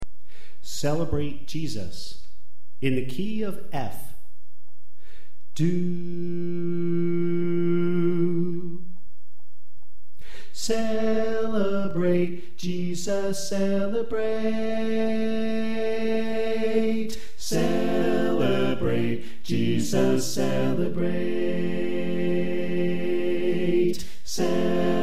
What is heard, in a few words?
Tenor Left